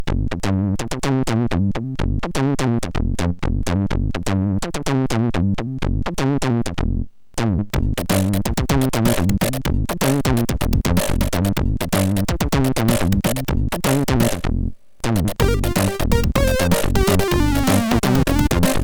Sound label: Funky, sid MOS6581R4